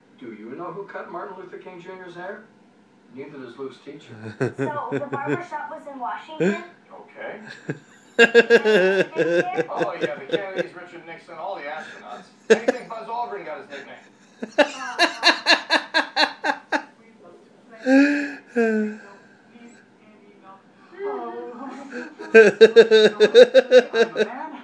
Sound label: laughter; modern family